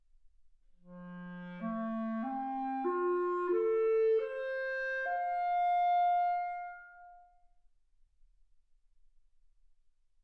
clarinet

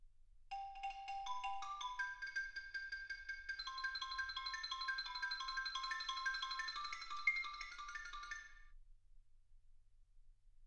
xylophone

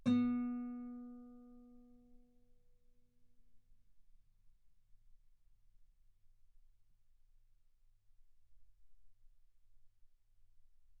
Guitar B3; ff; sul B

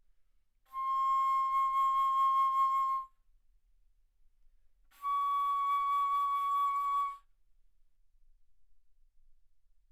Bass Flute C6 Db6; ff

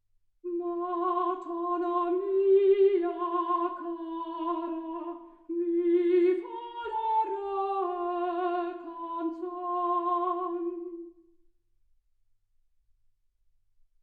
soprano

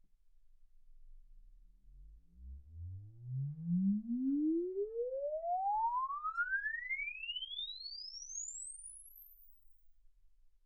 exp swept sinus 10Hz 24kHz

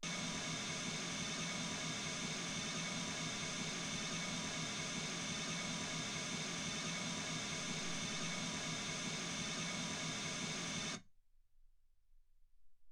mls16 48kHz

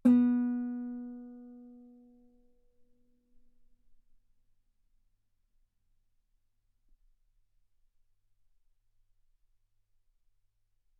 Guitar ff B3; sul B

